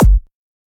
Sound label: Dr Kick